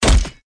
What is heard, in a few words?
beat